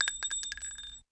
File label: player shell; cstrike